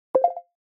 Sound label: ui modern confirmation ascending